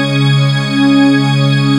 padpowerchord; jazz jackrabbit 2

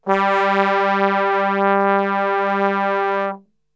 G 1 Brass Super Section; Best Service; TRMBSTRGHT; 90 s Sample CDs

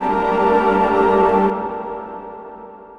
Pad

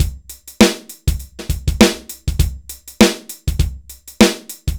dry loop, BPM100

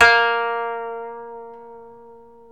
KOTO MILD

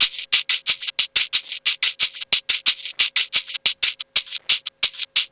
Percussion, R&B Drums

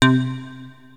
Access Virus B; Synthworx

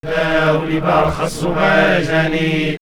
Ethno Vox Islamic Prayer; vox mix; X-Static Goldmine

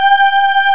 VOXSYNTH G2; HigherChoir Orch

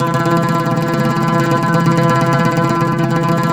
OUD TREM E 1; Soundscan vol.53; Mediteranean Traditions; AKAI

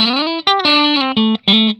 RIFF 120, Vintage Blues Guitar

